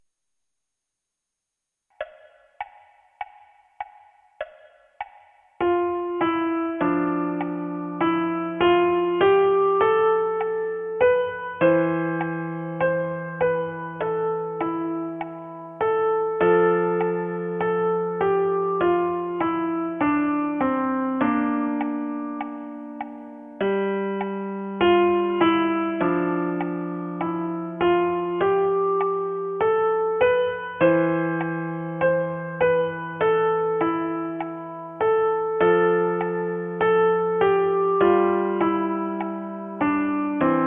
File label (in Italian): piano unite